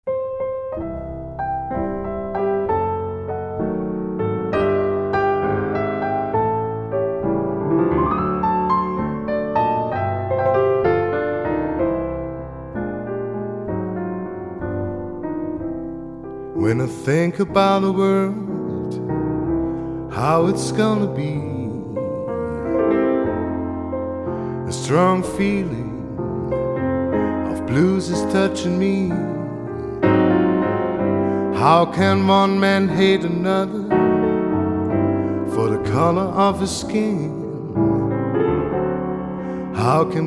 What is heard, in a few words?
blues